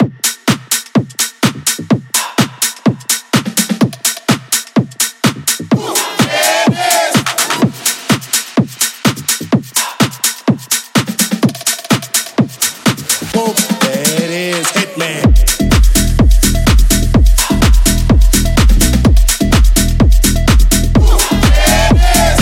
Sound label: Hip Hop Intro; Remixfreakz